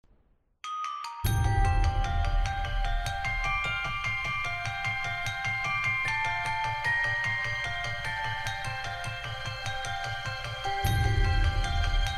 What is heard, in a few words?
orchestral arrangement; Spirit Tracks